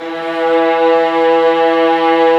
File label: STR VAS, Vlas Sect, Amb mf; String Master Series; Vlas Sect3-4